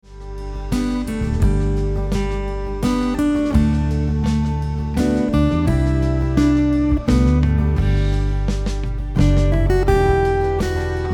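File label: Come Thou Fount of Every Blessing melody, guitar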